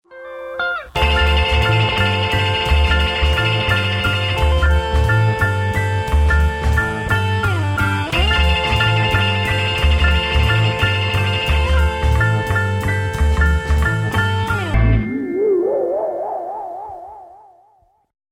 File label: jingle